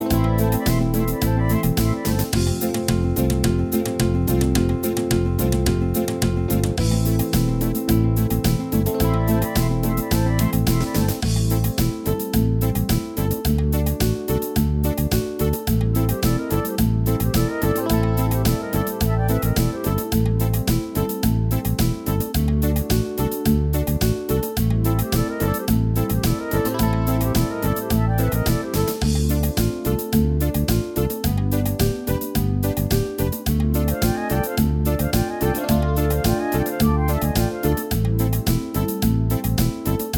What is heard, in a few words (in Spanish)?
cumbia